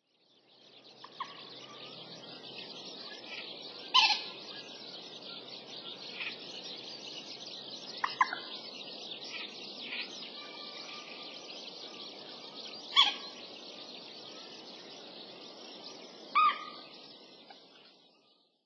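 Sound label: common moorhen; ban; watarase; koe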